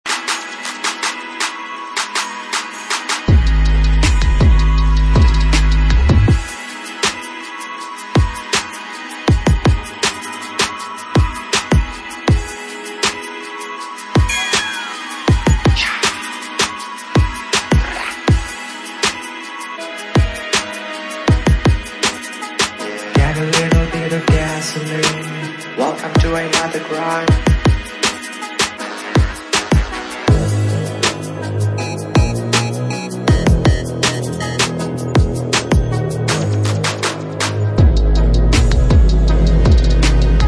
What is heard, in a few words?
Workouts motivation music